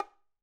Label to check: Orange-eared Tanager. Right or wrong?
Wrong